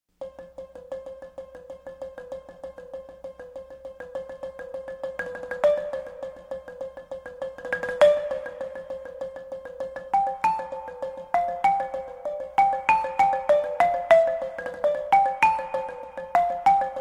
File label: Balafon